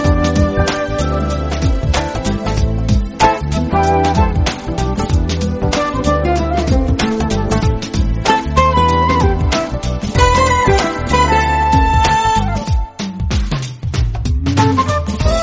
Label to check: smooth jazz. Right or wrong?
Right